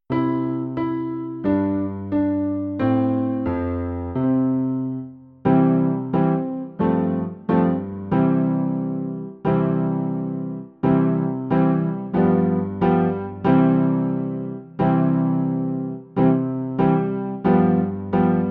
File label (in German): Regenlied Begleitung